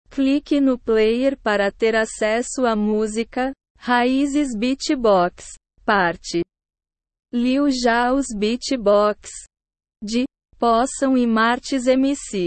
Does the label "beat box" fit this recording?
Yes